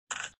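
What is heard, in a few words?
footsteps; jiaobu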